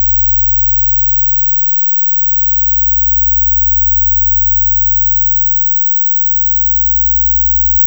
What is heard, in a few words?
Drone Ambient; Drums & Drones